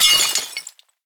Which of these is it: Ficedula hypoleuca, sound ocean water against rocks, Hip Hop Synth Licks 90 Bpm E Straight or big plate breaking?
big plate breaking